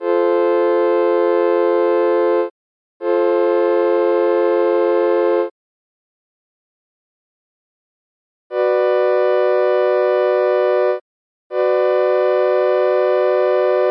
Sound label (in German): dur moll umkehrungen; tonmeister